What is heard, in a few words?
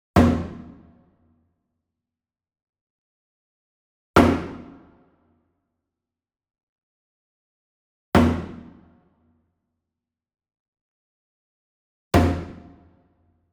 Wood Percussion Wood Drum Floor Hit Slap Medium; boom library; Cinematic Strikes Construction Kit